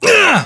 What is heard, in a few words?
pain, english